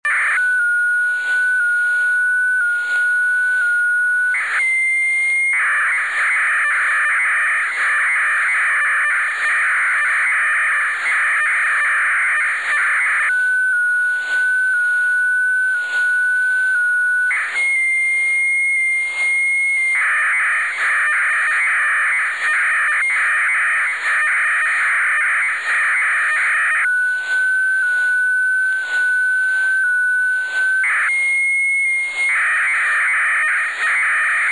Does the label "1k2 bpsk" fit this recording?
Yes